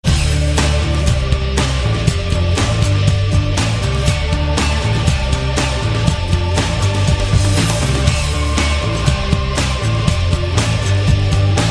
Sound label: call ringtone